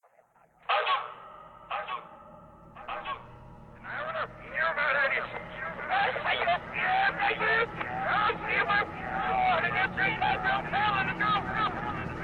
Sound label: Military Base Music; painkiller hell damnation operation zombie bunker